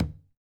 MADAL, DRUMS OF IND

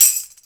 TAMBOUR K, Ragga & Dub Reggae

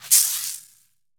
caxixi; AKAI S-Series CD-ROM Sound Library VOL-5

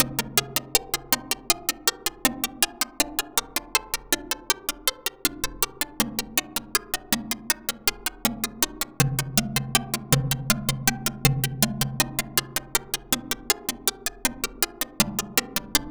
Synth Arpeggio, Synths; Eclectic Electronica

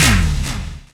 FX HIT, Techno Trance; Ueberschall

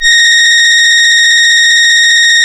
A#, Synclav FM, BRASS; Big Fish Audio

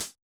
HH, Yamaha DJX 2B

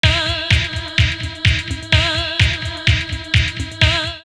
Voice 127, drumloops Techno House; Voice Float; X-Static Goldmine

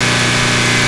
Engine Constants, Transportation

